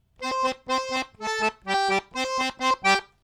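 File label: right hand licks, Phrase five; Back Door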